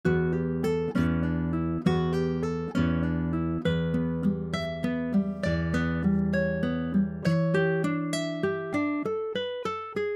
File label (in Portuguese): Canção para Violão